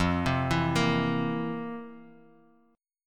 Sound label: F Major 7th Suspended 4th, Guitar, DADGAD; 3,3,2,3,x,x; down; chord shape